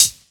studio closed high hat sample b key; hat samples

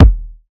tight low end kick single shot e key; kick drum samples

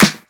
airy sizzle snare drum sample g key; snare drum samples

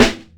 smooth snare drum b key; snare drum samples

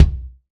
short reverb bass drum single shot d key; kick

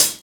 light hat sound sample d key

